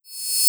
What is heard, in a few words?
reverse Cymbal